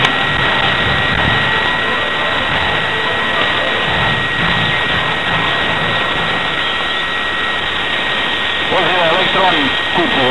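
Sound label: 1709 khz, pirate radio; Elektron SRB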